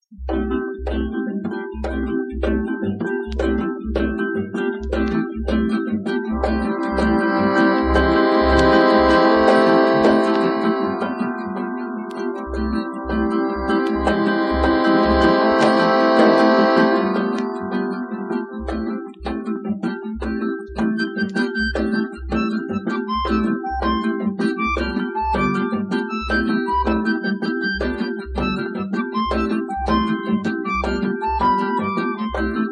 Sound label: Jamaican Rumba